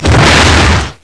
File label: musket shot